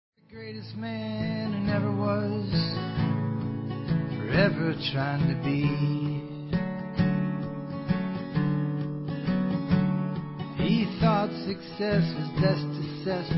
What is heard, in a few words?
Acoustic